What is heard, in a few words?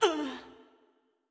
Hurt; Jackie